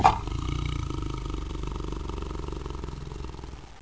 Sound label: Hippopotomus; How Would You Survive (1995); Grolier